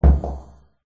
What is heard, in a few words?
mechanical; c-evo